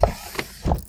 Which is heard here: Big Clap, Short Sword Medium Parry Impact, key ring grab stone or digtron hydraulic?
digtron hydraulic